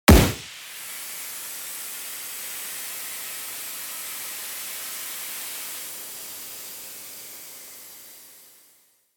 Smoke grenade explosion air hiss

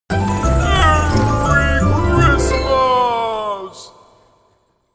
Magical merry christmas festive celebration

